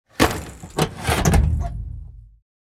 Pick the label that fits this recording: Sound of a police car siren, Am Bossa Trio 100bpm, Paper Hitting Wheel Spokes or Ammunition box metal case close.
Ammunition box metal case close